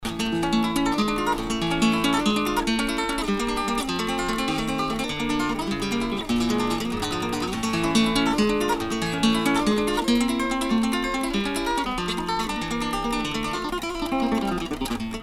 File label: Bulerias